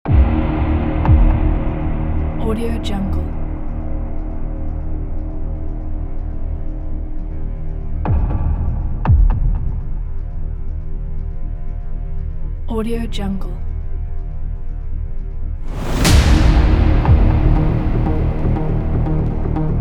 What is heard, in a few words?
movie trailer